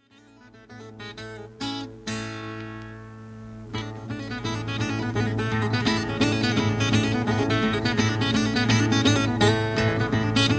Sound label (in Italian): ballo sardo; sardegna; chitarristi